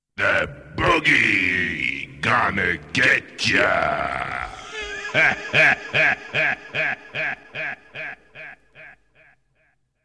Jingle

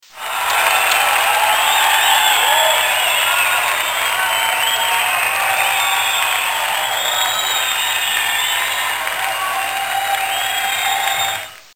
Bravo

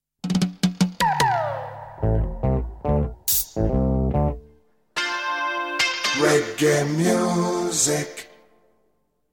Jingle

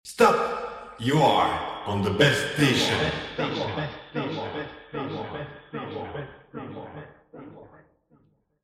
Jingle

